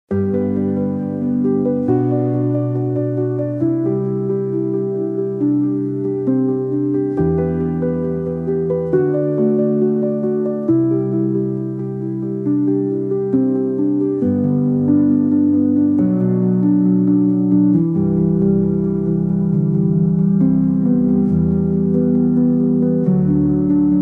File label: silent piano